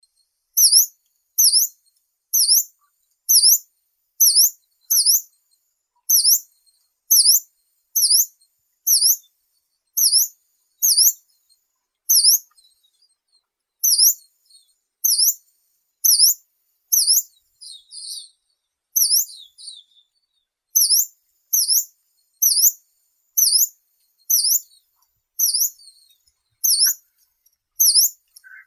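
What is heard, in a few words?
yellow-browed warbler, Phylloscopus inornatus; penochka zarnichka; zvuki prirodi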